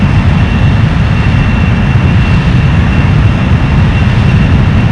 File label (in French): vaisseau; Big bang